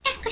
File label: alert